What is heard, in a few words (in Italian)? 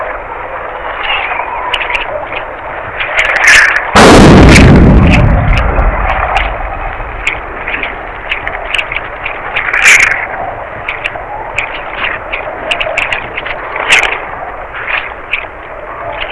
Lunigiana, Sonificazioni Eventi Sismici